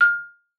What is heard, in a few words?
MARF F6, MARIMBA; Xsample VOL-4